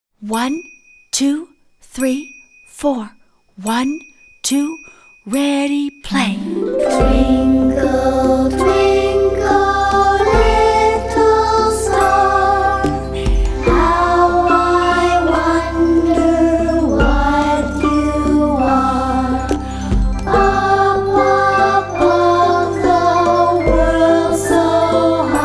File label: Twinkle Twinkle Little Star